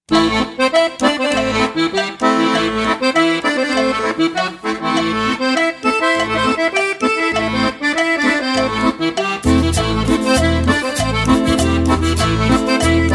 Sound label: Cumbia Medley